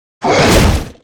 Sack Miner attack; KuberaMine

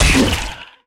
Zombie Warrior damage

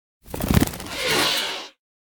butterfly pollution death

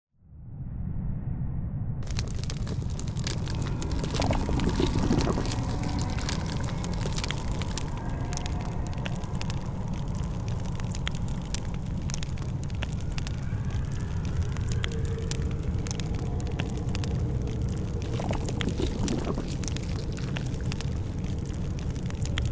Volcano env; Ignis

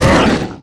Sack Miner hit; KuberaMine

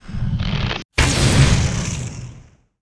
attack; kenta

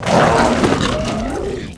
death

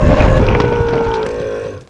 death

